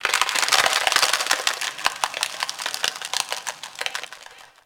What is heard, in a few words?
Landscape Ice Crater Creak, Ambience; Warframe; Venus Open World; One Offs